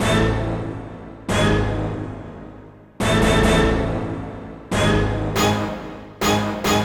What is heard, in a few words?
Stabs Orchestral Synth, Cinematic Trailer Loops